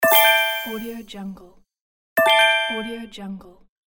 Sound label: rpg map indication